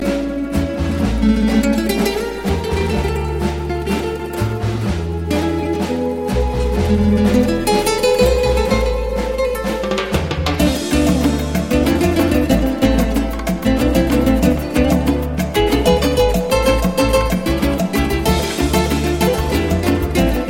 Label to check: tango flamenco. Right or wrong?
Right